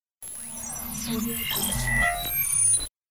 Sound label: hi tech sci fi data